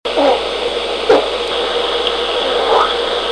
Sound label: bark spit